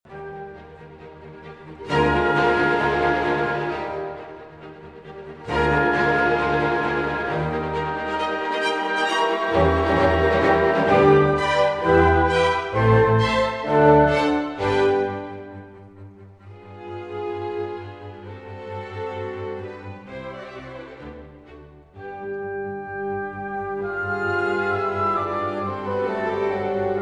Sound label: Piano concerto n 17 K 453 I Allegro; HYPERION; Mozart